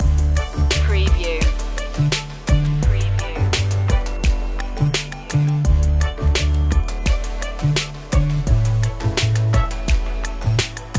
kids hip hop loop

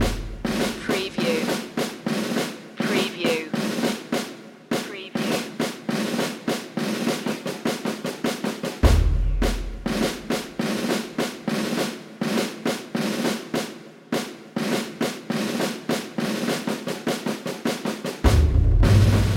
tattoo drumline underscore loop

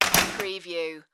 fence door close

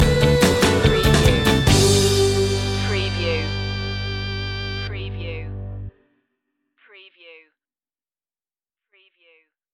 driving in my woody stinger

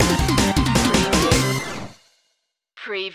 retro video game racing rock stinger

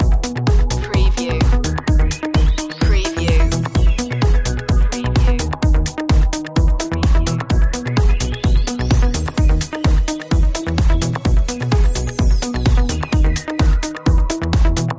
night train loop

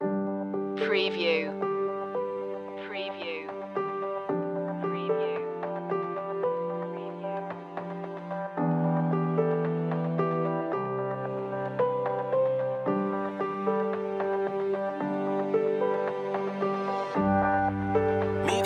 warm breeze

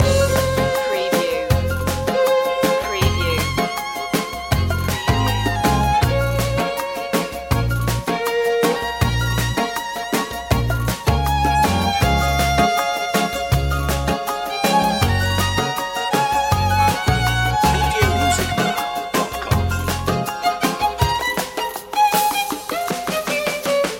gypsy wedding loop

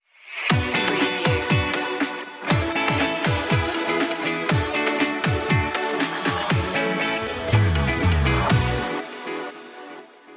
jump high stinger

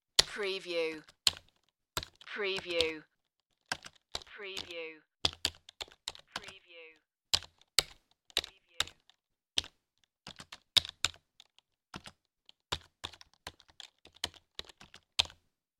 Computer Keyboard Typing Slow

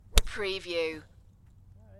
Golf Driver

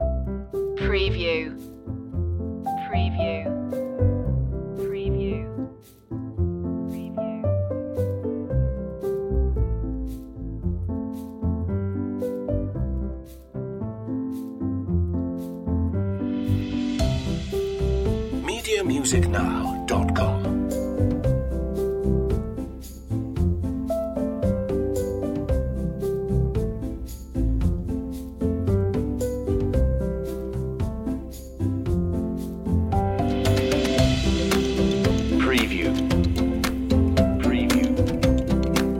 clap skip jump no vocals no mallets

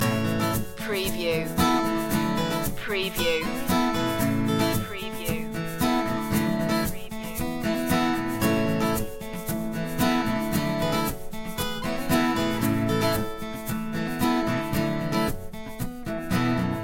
loop

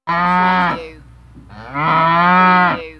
Cow Two Moos

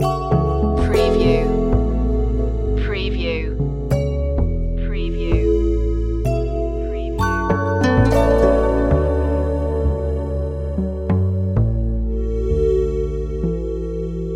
loop, chill

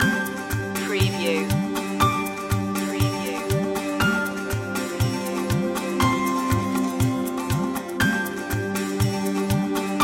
oriental fantasy loop

